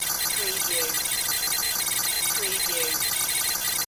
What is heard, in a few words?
Data Scrambled Readout